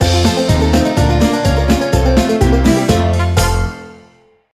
Sting Happy

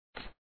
Ticket Move; papas hot doggeria; android; x68000

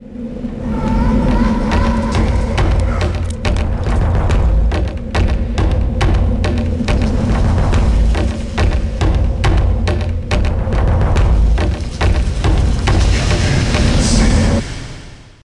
eternal autumn - transition

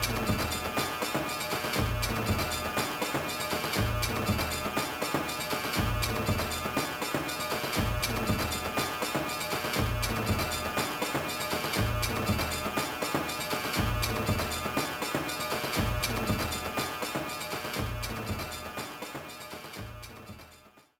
BGM enter barracks, soundtrack; Avatar The Last Airbender

